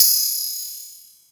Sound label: PERC, 160 BPM, Drum & Bass; Ueberschall; Drum & Bass Flash Trackz